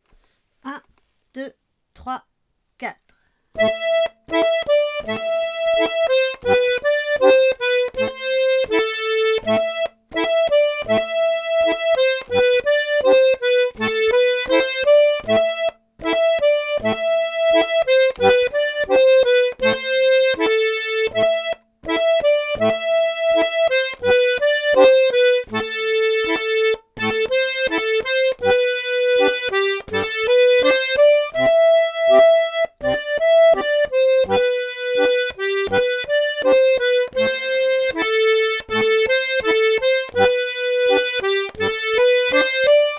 rigodon 2 voix lent

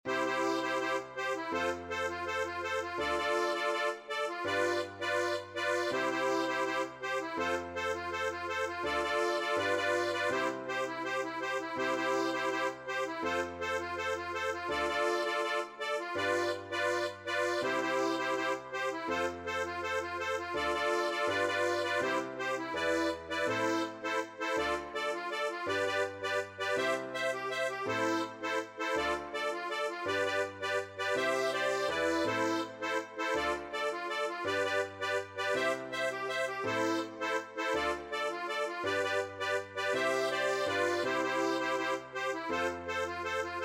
scottisch mazurka ryth

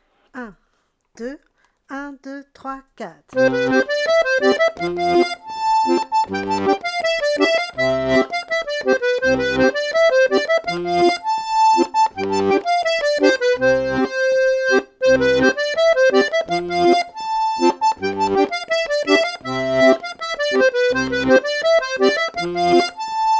scottisch; de Moustier blanche 82

